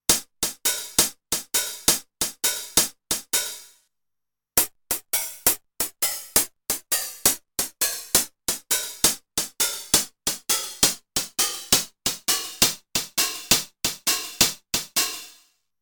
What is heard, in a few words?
hihat pitch, TR-909; modifications